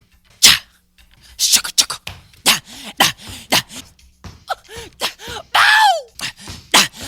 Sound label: grunts